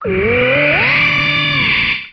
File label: cry